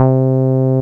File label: ACID C3, Alpha Dance